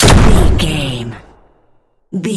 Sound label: gun shotgun sawed off shot